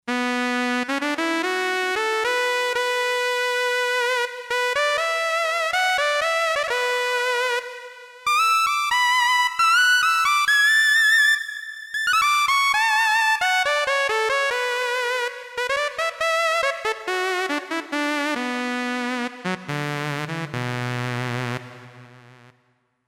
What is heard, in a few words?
OB SAW LEAD, Roland SC-880; Factory Patches